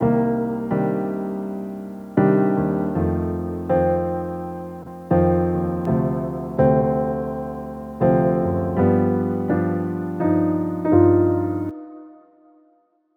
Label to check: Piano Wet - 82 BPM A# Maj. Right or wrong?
Right